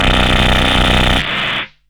Car Trash, Hard & Loud Techno; USB Soundscan vol.01; AKAI

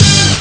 blast; Amiga Soundtracker Sample Packs

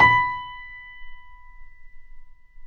Mf B 6, Grand Piano off mic

